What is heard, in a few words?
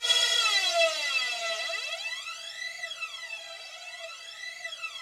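Yamaha VP-1, rough strings E4; 90 s Sample CDs; Music Store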